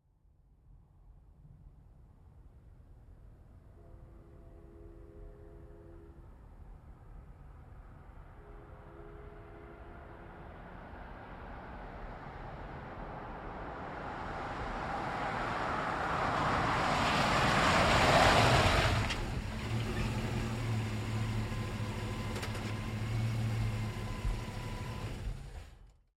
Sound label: GMC Savana, Up, Stop, Fast, Truck; 2010 GMC Savana; Trucks; Vehicles